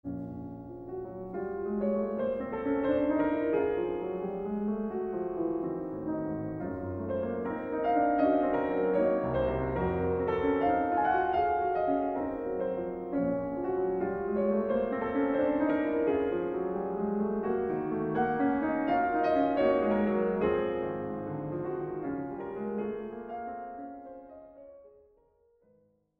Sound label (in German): Variationen b moll